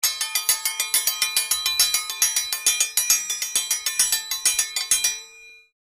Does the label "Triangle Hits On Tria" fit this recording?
Yes